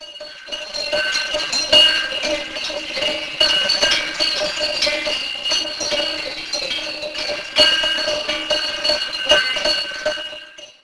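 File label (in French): clochette troupeau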